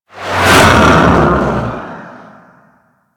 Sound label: Grineer Fighter Ship B Close Short Fly By, Space Fighter Ship Engine; Warframe; Railjack; Enemy Ships